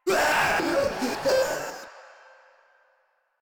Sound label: Proto Infested Runner Player Spotted; Warframe